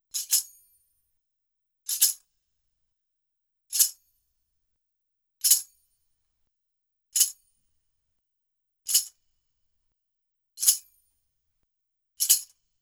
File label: Metal Shaker, Celtic; Sonic Foundry (Sony Creative Software); Continental Drift Aftershocks; Percussion